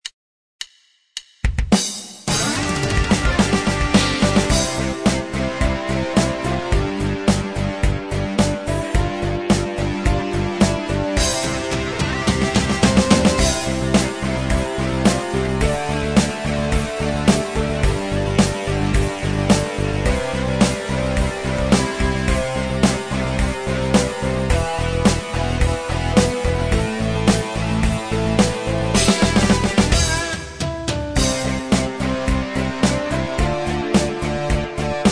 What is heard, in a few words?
hard rock